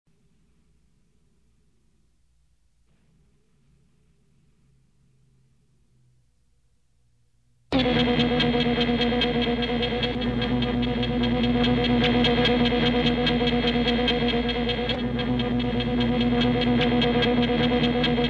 power room; background; TOS

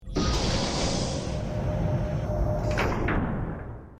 federation large door open

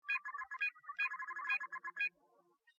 astrometrics controls, computer

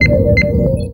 ambient bridge, computer

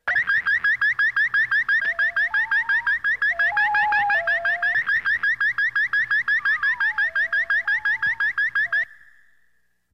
viewscreen

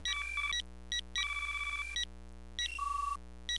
computer beep sequence

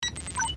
computer beep

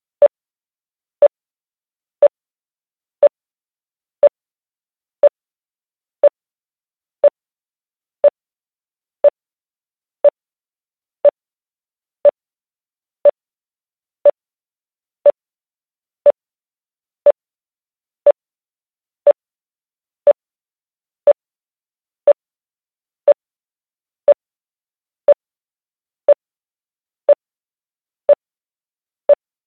sickbay beeps

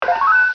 com beep, computer

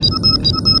ambient bridge, computer

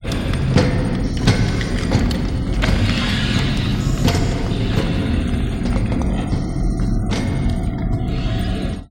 borg stepping

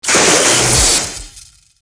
console explosion

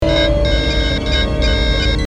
alert, computer

